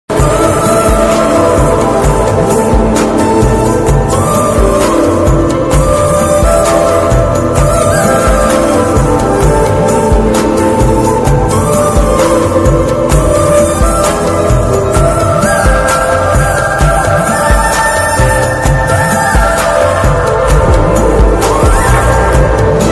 world best flute ringtone new flute ringtone love ringtone romantic ringtone hindi ringtone